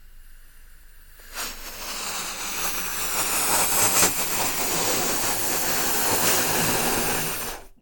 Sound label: blewing into a balloon